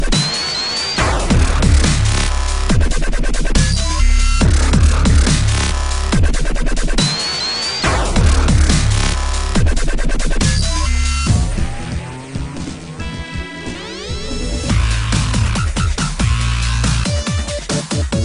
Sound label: Dubstep; Sonic